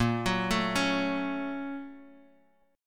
A sharp Minor Major 7th Sharp 5th A sharp Bass Standard; A#mM7#5 arpeggiated; JGuitar